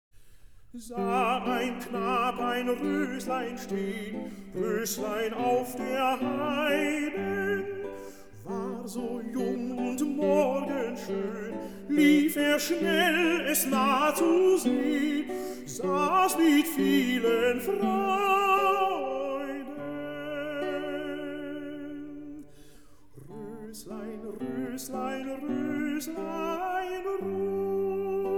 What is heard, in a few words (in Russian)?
Песня на стихи Гете ми мажор; Шуберт; 'Дикая розочка'; D257